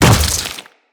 step flesh, footsteps; Grineer

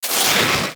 Armadillo Roll Snow

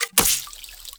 Pool Noodle Catch, Melee

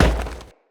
Crewman Footstep Walk

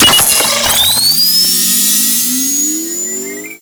Orbital Strike Warning Target; Warframe; Veilbreaker; Sprag

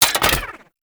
Spear Gun Mech; Warframe; Corpus

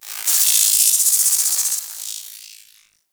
Tech Movement; Warframe; Corpus; Railjack

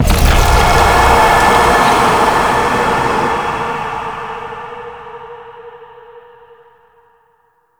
Hex Appear; gameplay; Warframe; Nineteen Ninety Nine; Stage 11 Future Past

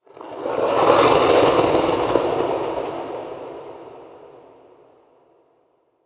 Crypt Cold Texture, Ambience; Champion Crypt

